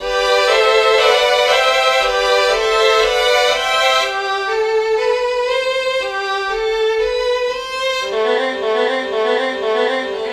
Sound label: Violin mimic Rev